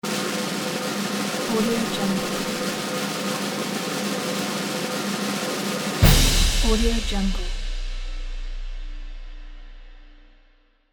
snare roll with cymbal; audio-therapy

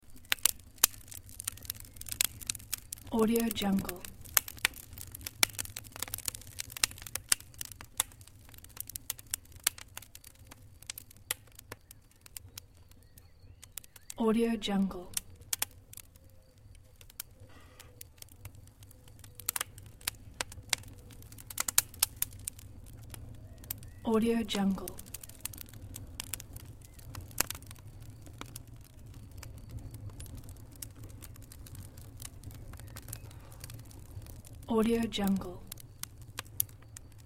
crackling fireplace

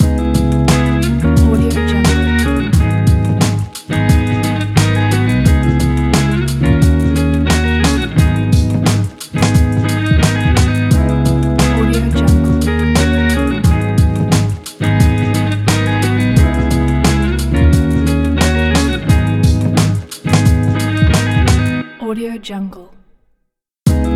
lounge chillout podcast logo

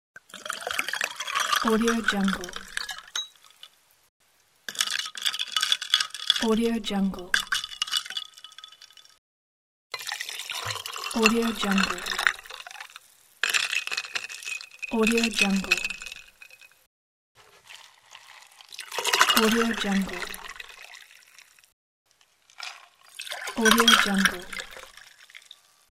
liquid is poured into a glass with ice

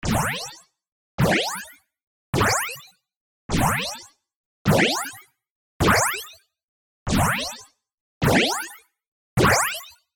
item boost

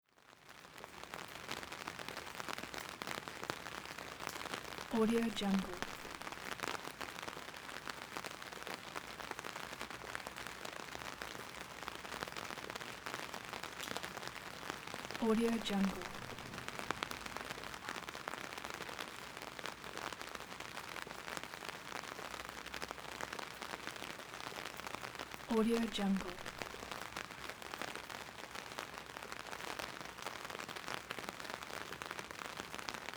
light rain on umbrella; bestsfx

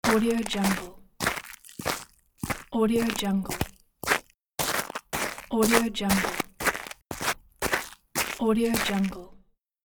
walking in the snow in boots; kaelv

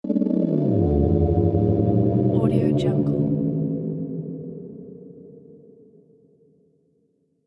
scary haunted house transition sfx

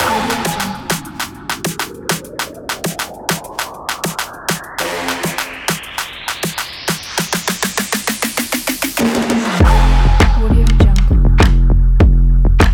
a techno

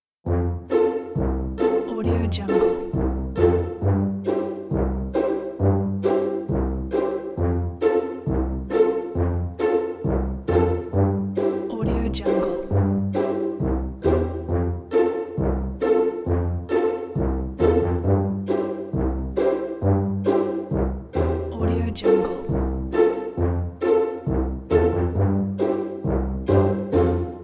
funny

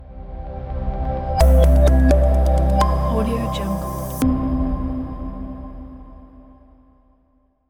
corporate logo

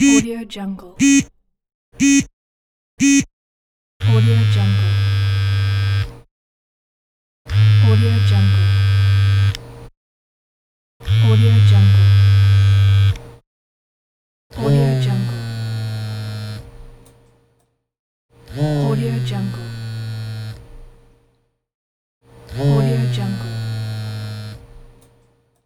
cell phone vibrate

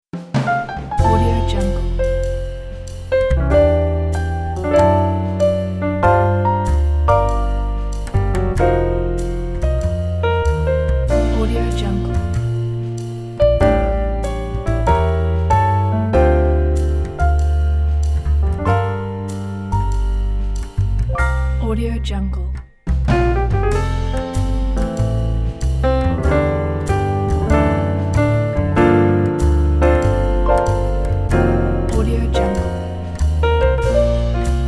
the jazz trio

